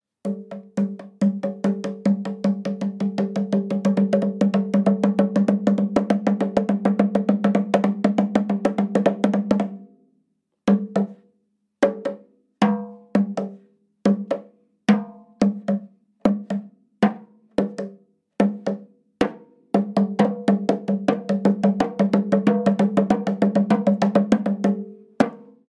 Bongo drum

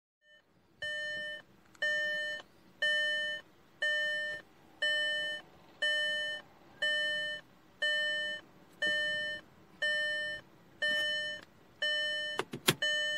Seat belt horn

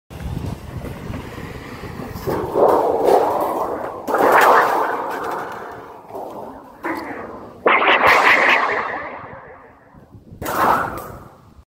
metal plate

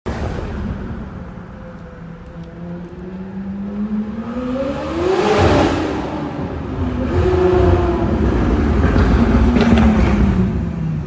McLaren

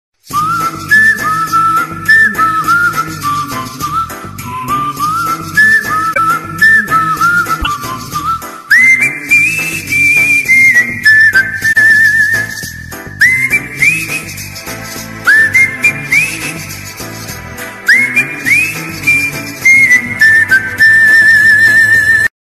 Whistle Ringtone

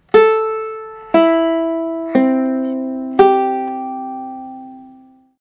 Ukulele

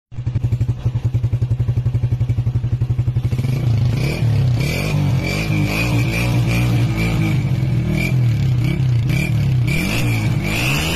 Shirazi exhaust

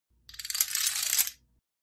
Domino

